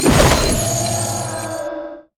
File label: lantern; LoL; thresh